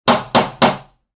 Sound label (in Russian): molotochkom; Звук стука в дверь